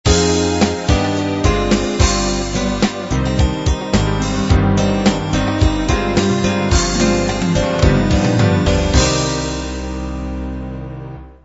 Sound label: love 8beat end